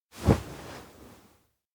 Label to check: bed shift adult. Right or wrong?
Right